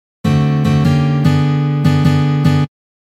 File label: Blues Guitar Turnaround